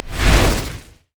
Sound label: dragons descent; LoL; shyvana